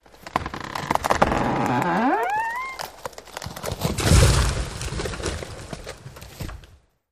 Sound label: Tree Large Wood Stre